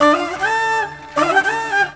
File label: World Lick